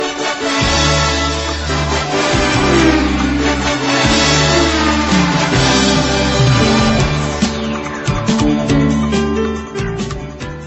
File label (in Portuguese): musica vitoria